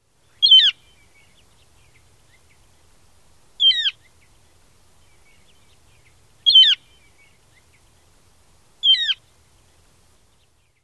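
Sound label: keew call, bird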